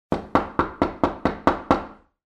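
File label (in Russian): кабинетная дверь; Звук стука в дверь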